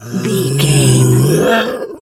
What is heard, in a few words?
bear pain hurt groan